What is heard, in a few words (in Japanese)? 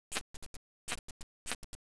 tsuyumushi, insects